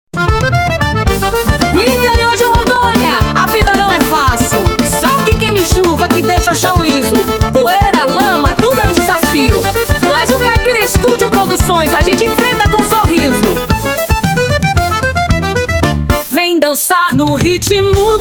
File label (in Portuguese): caipira forro; caipira estudio e producoes